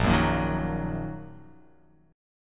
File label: A sharp Suspended 4th Sharp 5th; strummed; A#sus4#5; JGuitar